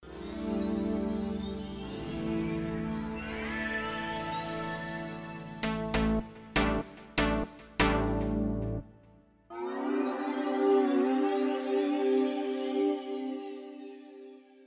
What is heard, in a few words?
AI synth